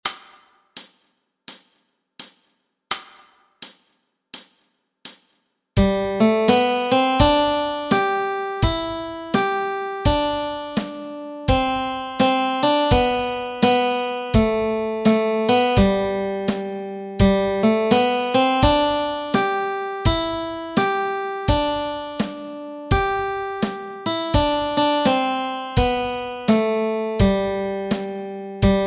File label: Melody Track